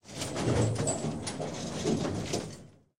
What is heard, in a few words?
rocket reload; tanks; War Thunder